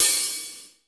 ROOM OH, ROOM KITS; Crypton - DrumBase5000

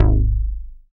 BAS MKS-50, Roland

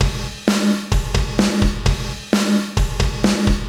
Beat (130BPM), Gated Reverb; musicradar

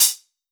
Metal Spit Defiance, Hats; Airwindows

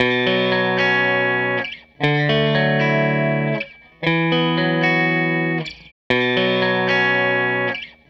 Tele Guitar Loop; Sonic Foundry (Sony Creative Software); Six-String Orchestra

